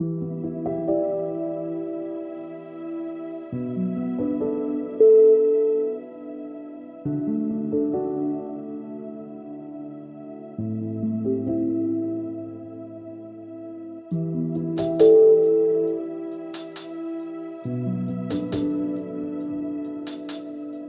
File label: future ambient